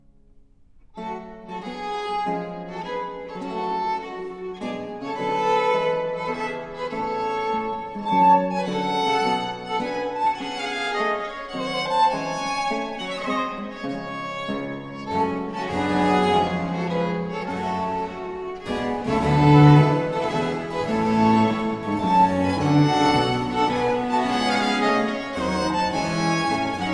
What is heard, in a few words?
Sarabande grave in G minor; Armonico tributo sonata II; Georg Muffat